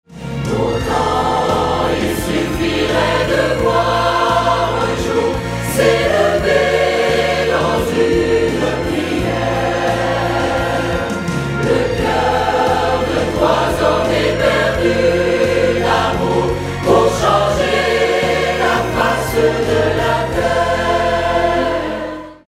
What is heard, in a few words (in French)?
SATB Si bemol mineur